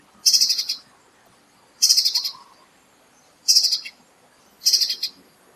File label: Grey-breasted Spiderhunter calls; Kledang Sayong Forest Reserve Ipoh Perak Malaysia